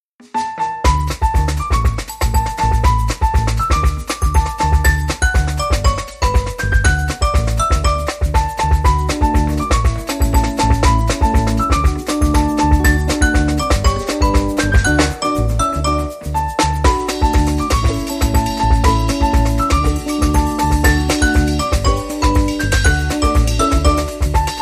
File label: Happy Birthday Tunes